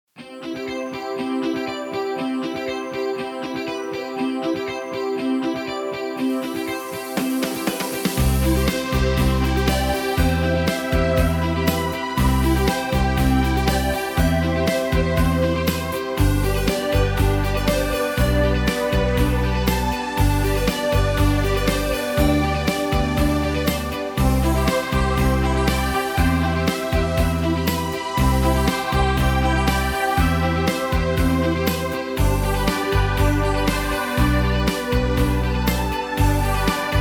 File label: Background Music